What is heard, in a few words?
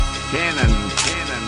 Cannon